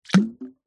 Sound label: nature water small plop splash stone drop on